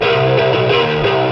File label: guitar